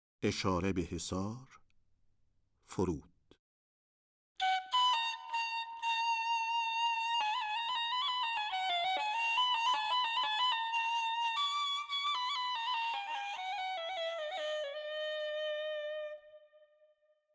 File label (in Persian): Eshare be hesar, forud Segah Radif-e Ney, Dastgah Segah